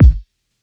kick; eddyflux; crate bd; dough-samples

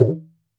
gumki, mrid

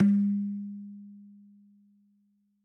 dhum, mrid

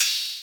oh; drumkit; switchangel

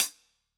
closed hh, crate; eddyflux

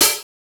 OPEN HH, LinnLM2; drum machine

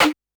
snare; dough-samples; eddyflux

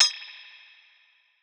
perc; eddyflux

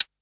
closed hh; eddyflux

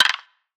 perc; eddyflux

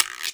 Guiro, Boss DR660

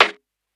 snare; dough-samples; eddyflux

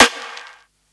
snare; dough-samples; eddyflux

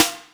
Hous S, Boss DR660 sd

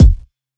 kick; eddyflux; crate bd; dough-samples

